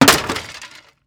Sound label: Impact Plastic Smash; Impacts